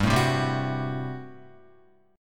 G Major 7th Suspended 2nd Suspended 4th G down Guitar; GM7sus2sus4 strummed